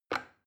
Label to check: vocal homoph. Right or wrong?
Wrong